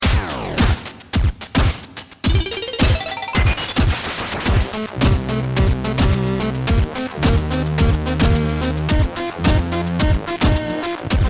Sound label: Minigame Music; Nickelodeon Teenage Mutant Ninja Turtles